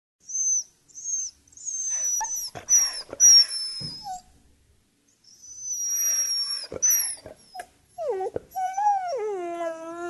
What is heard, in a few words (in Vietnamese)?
con chó huýt sáo; am thanh khien ban khoc